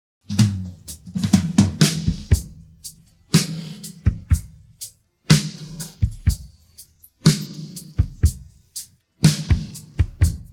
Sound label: pattern, drums